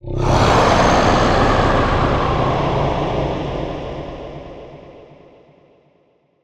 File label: Distant Absctract Scream, Monster Sounds; The Decline Of Warriors; Monster Sounds Volume II